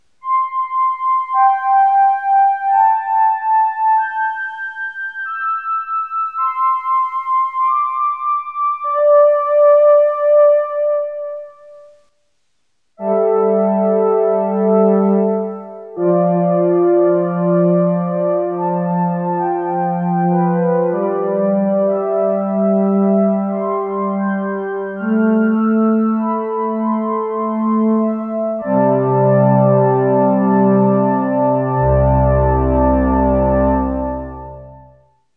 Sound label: synth string